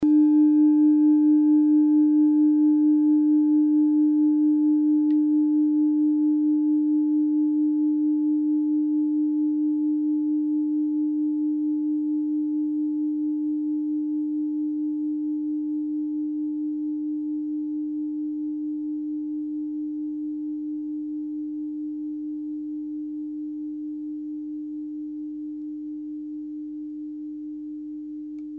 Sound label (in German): kleine klangschale